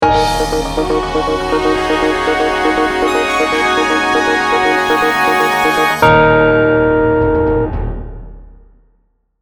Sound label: news music